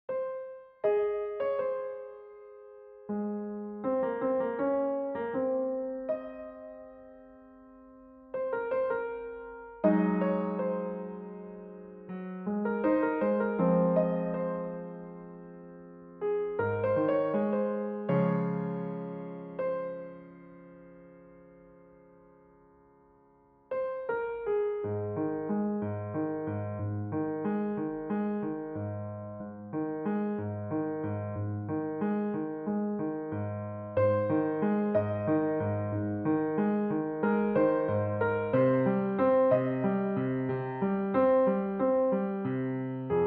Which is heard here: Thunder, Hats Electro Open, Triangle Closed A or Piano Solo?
Piano Solo